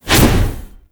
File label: Hammer Whoosh; Warframe; Veilbreaker; Sprag